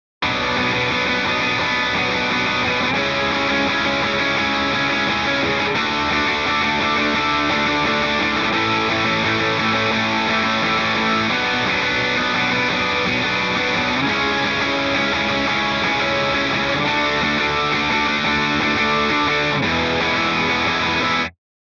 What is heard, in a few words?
guitar off